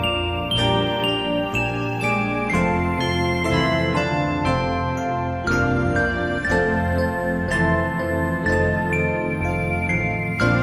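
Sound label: new year instrumental music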